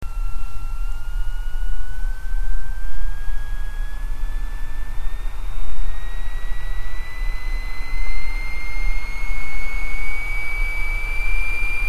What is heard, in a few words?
fan passing; vaio